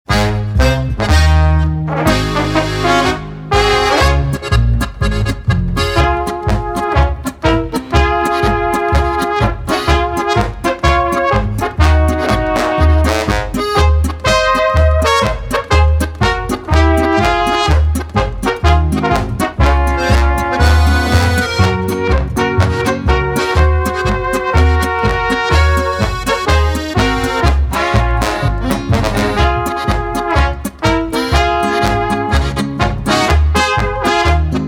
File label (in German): Goldene Trompeten